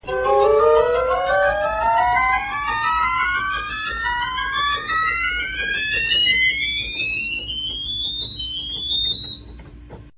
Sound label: mixture, organ